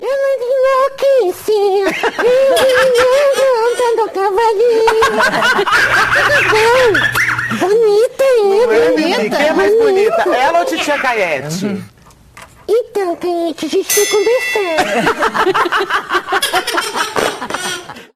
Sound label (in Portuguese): cantando